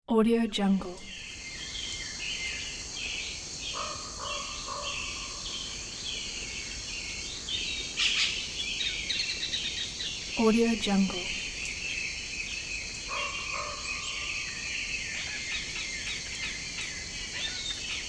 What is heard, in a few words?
morning in forest